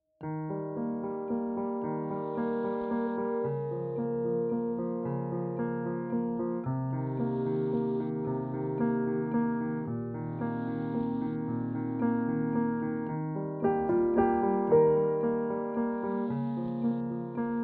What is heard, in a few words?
Sad Soulful Piano Theme; rock indie pop